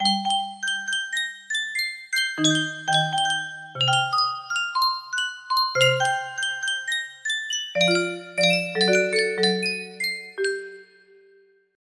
music box melody

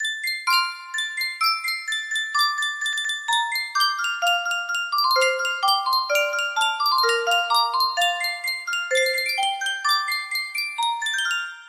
Sankyo custom tune music box melody

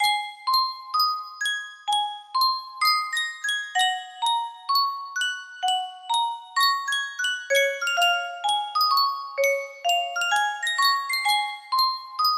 Yunsheng music box, music box melody

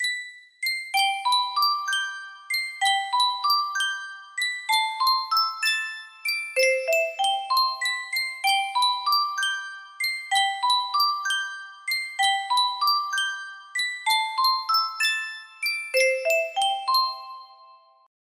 sankyo custom tune music box

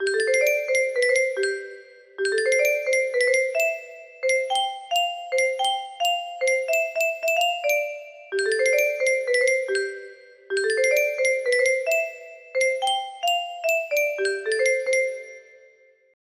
music box melody, 5 Semitones down; super mario 3d world

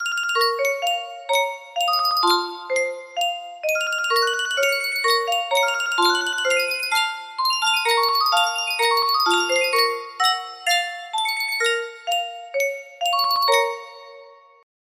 Sankyo music box, music box melody

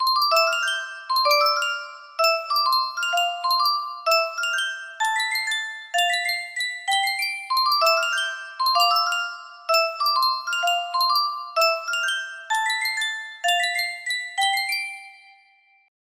Sankyo custom tune music box, music box melody

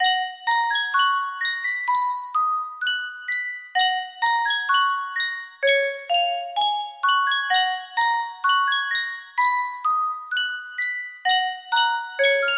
yunsheng custom tune music box

